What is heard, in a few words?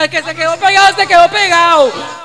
scorp laugh